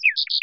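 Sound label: Nature Speak Error; windows xp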